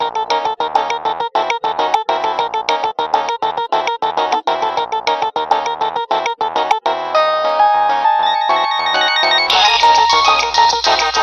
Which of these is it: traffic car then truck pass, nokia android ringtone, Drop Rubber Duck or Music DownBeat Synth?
nokia android ringtone